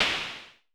SN Low Punch, Vermona Mono15 Drums Snare

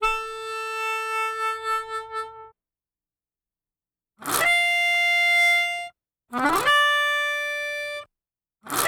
Melodica Melody, African; Sonic Foundry (Sony Creative Software); Continental Drift Aftershocks

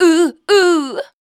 VOC F, Dance Vocals, FEMALE

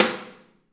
Snare OH; Snare OH All; Drums Percussion

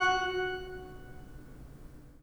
PIPE, 3DOrgans

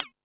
Tool Kit, Drum Machines

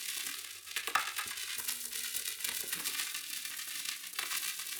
Cracklature, sparse soundscape

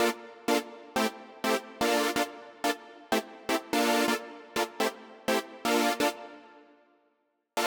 Synth, 125bpm Dm, Nu Disco; Zero-G; Construction Kits; Speedy

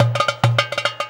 DARBUKKA

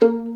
Violin Pizz A#2; InVision Interactive; Lightware VOL-1; Instruments & Percussions

